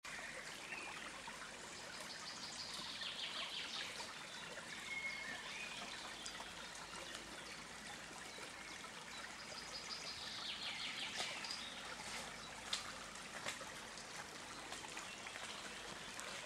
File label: calm nature sounds